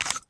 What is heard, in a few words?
usp silencer screw, weapons; cstrike; csgo